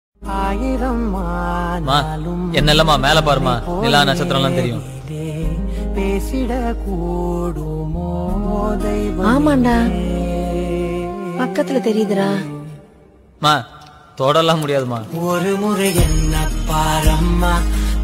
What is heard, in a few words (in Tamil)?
song tamil